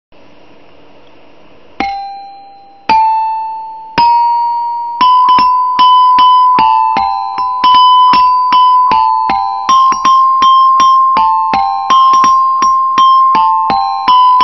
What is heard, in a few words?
Waltz; Haiku Chimes